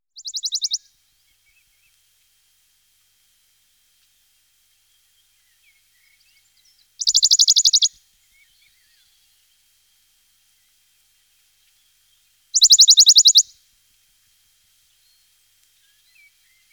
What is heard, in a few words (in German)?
berglaubsaenger, gesang; singvoegel; roche 2004